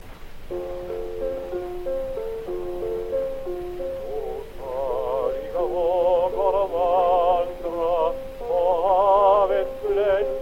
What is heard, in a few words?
Gramophone